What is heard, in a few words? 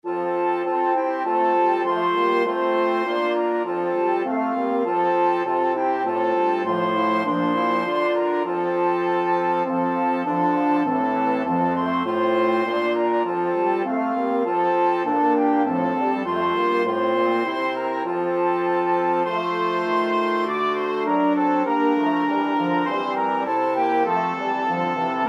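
piano flexible ensemble, 4 players; flexible mixed ensemble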